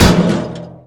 Impact; RallyC

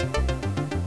Short Down; HelloWorld8Bit

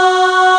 Vox F#3

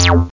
fast envelope; 303emu

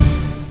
ORCH

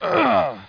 damage; lands

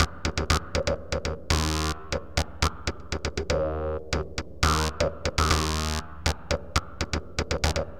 TECHNO, Rythme

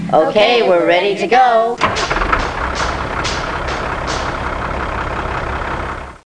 gameover; PUZMANIA

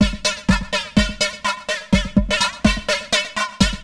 HOUSE 125; eJay

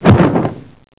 Crush; Racing Days R DEMO

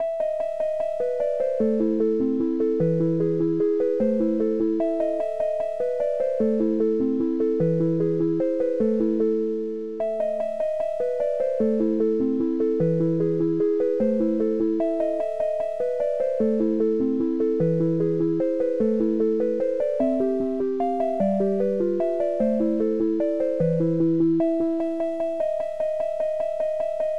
Rhodes for Elise

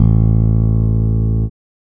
bass

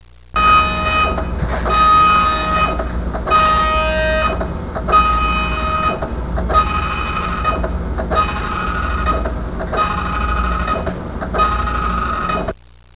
PRINTER; Talking E-Mail

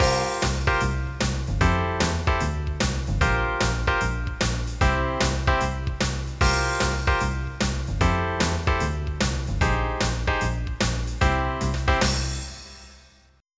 tune; Špidla: Hráčůj ráj 2; SuperFruit; MIDI Music File

